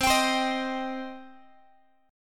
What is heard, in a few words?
C Minor C down Guitar; strummed; JGuitar